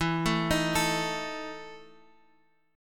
E Major 7th Suspended 4th E, Guitar, Half Step Down